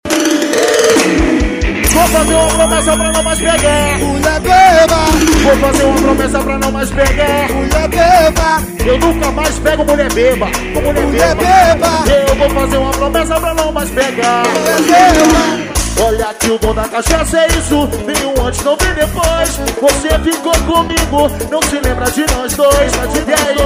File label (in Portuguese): com banda ao vivo